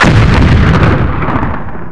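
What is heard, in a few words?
explosion; quake2; chaos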